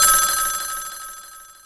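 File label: US telephone; Back In Time Records; Big Bang; SFX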